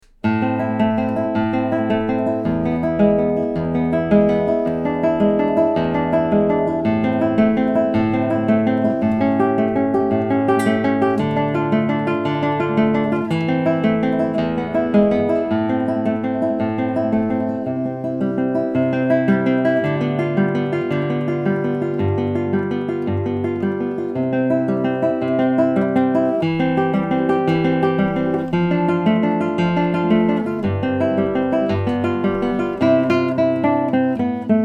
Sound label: Prelude in A Minor, guitar; Carulli